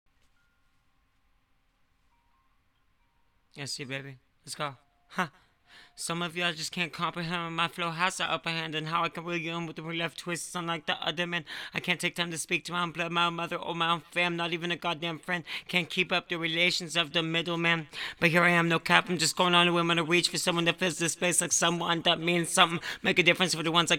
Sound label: vocals